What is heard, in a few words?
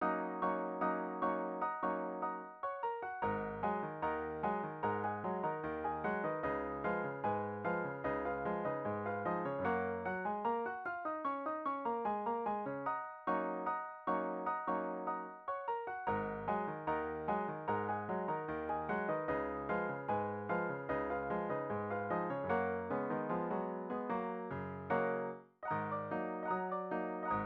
Pianola